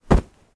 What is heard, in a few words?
THUD Smooth; mono; Universal Sound FX; THUDS & THUMPS